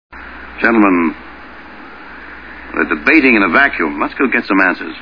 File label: vacuum